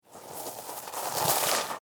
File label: Soccer Ball Roll; Sports; Soccer Sound Effects; SoundEffects-BlastWave